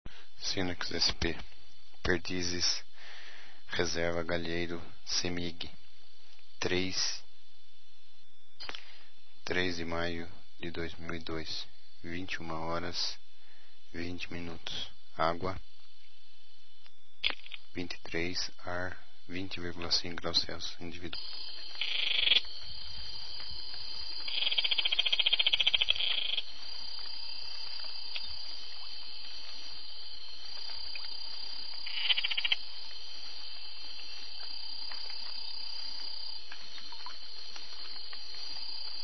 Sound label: Scinax canastrensis